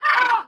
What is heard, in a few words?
Caw; Lethal Company